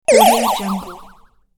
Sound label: air bonus wobble